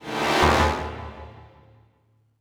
Music Boss Stinger Stereo; MushroomBoss

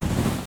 ground swords prepare; gg pure vessel

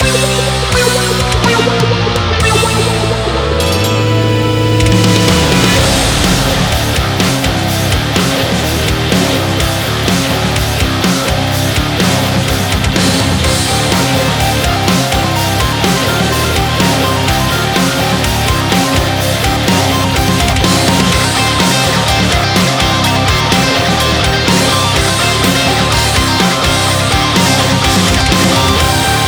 BGM RAID LOSE; Busou Shinki Armored Princess Battle Conductor; arcade

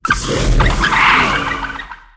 Wheelchair; SFX Game Wheelchair

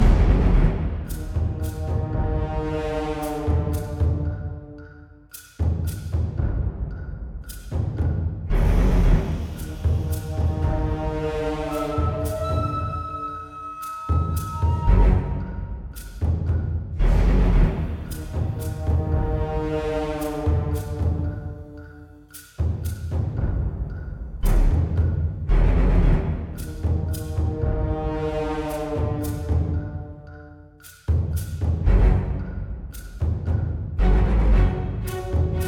amb ruins urgent; edgering; Tyranny Gold Edition

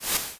Swoosh; Eleven: Stranger Things The Game

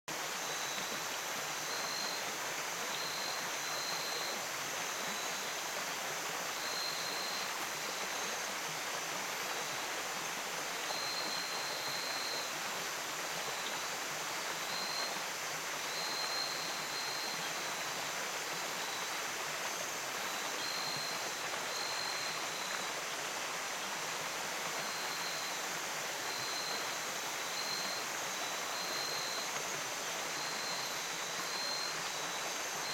Ambience Waterfall; angry birds time travel android gamerip 2019

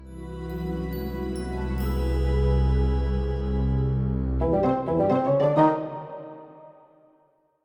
Jingle Romantic Surprise; Jack Keane

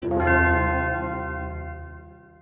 hatch monster sound; Caravan Beast

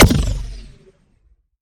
Sciweap Shot Medium Blaster Proton Rifle

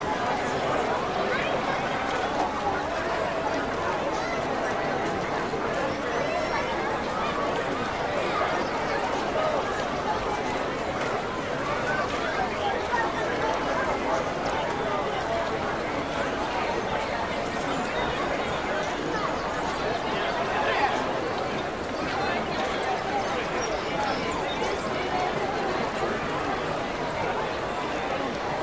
City Anc Large, Ambience; Civilization V

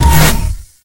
Pickups

